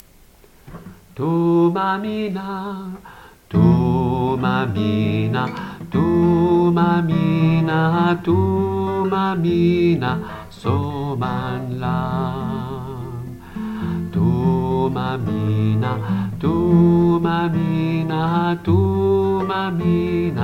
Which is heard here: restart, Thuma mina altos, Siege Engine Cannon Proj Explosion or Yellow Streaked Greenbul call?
Thuma mina altos